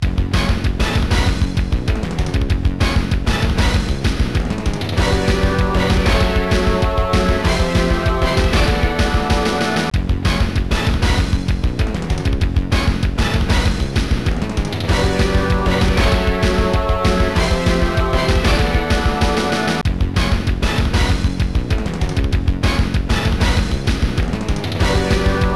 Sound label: Continue